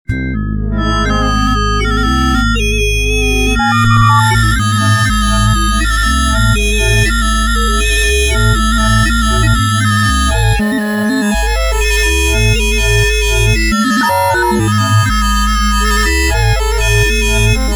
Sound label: PSR-12, Circuit Bent